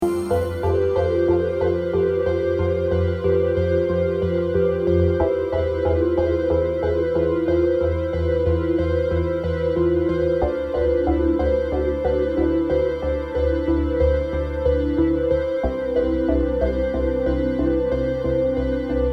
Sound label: New Age